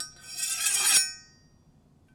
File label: Big Knife - Scrape, kitchen knives; Micro Pack